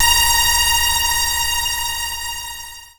PLUCKED, Synclav FM, A#; Big Fish Audio

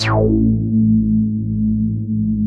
DEEP BASS; Masterbits; Dance Trax

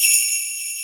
SLAY BELLS; Hip Hop; E-MU Formula 4000 Series Vol. 1; Hip Hop Nation

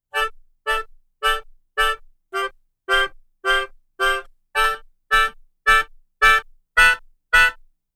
Melodica Rhythm, African; Sonic Foundry (Sony Creative Software); Continental Drift Aftershocks

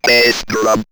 Robot Bass Drum, robot vox; X-Static Goldmine

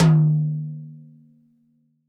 TD-20 Tom B, Roland TD-20 Toms

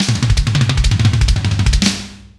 kit, GTR; Roland - LA Composer vol. 2

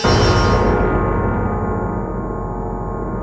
G#3 fuzz; Northstar; The Wizard VOL-1; BELLICOSE

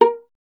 STR VLA, Viola; Miroslav Vitous

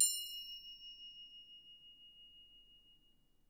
Percussion F4, Harp; Miroslav Vitous - Percussion & Harp

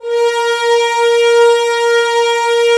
STR, HZ Horns; Roland - LA Composer vol. 4

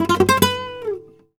e, Flamenco Guitar Picado; Picado 1

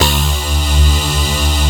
SY big synth

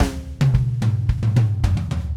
Red Maple Groove 110, DW Red Maple Kit; 110 BPM; Sonic Foundry (Sony Creative Software); Vital Drums

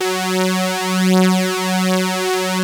POLY SYNTH; Wizoo - Powered Wave

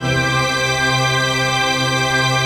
PAD, Dance Mega Synth

